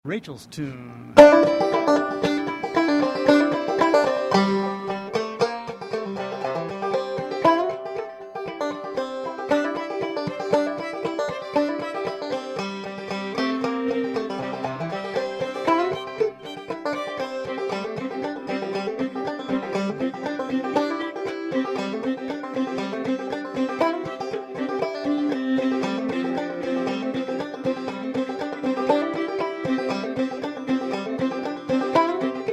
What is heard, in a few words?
Banjo performance, acoustic string band, recorded live; On the air at WMPG in Portland, Maine